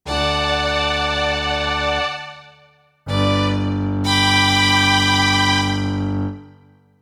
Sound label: Fiddle; Freddi Fish and the Case of the Missing Kelp Seeds Remastered